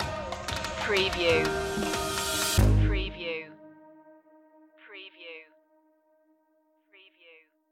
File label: bluestone streets stinger